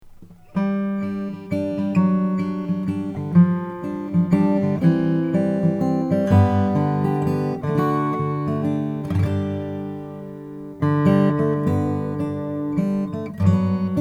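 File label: froggy bottom p12 deluxe bastogne walnut, guitar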